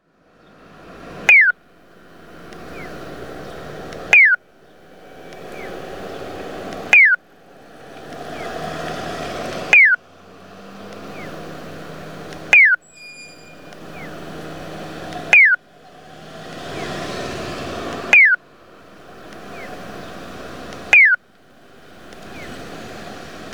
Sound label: piyo, traffic signal; rikuun GC2000A; onkyo kosaten; saitama; ageo; kashiwaza 2chome